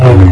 saber swing; lightsaber

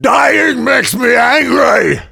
near death

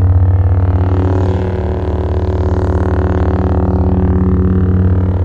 saber loop; lightsaber

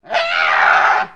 leap long, banshee; npc

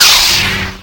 saber on fast; lightsaber

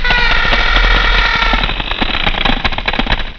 momma pain

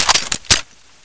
smg reload

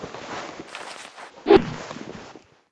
sledge swing

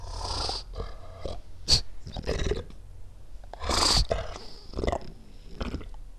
banshee gurgle loop; npc

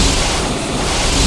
saber hit, lightsaber

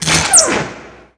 saber hit laser; lightsaber

